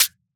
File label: Percussion, Hip Hop; Cymatics; 9God Hip Hop Sample Pack